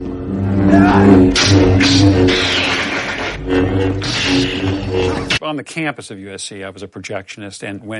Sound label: lightsaber